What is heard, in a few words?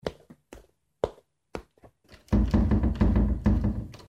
footsteps, door locked; Bad Water